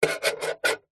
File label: office pen biro draw shape on metal box